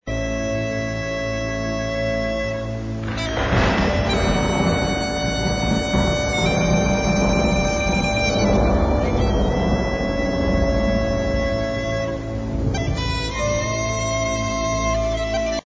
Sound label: Celtic Air